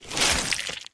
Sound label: human form short move; flood